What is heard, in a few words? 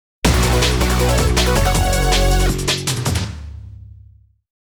Jingle; Fate Tiger Colosseum Original Sound Track [Complete Edition]